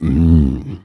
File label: Eats Hm